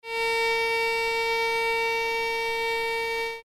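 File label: A sharp 4 no vibrato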